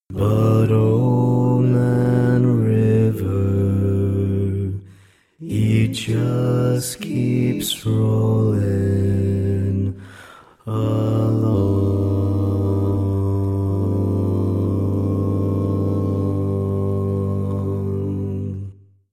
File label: Bass, barbershop tags